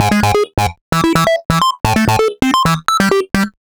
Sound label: 8-Bit Arp, Underground UK House & Electro, Synths; Sonic Foundry (Sony Creative Software)